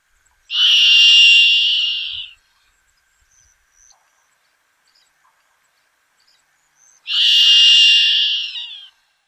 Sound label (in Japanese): kanmuriwashi, ishigaki, nakigoe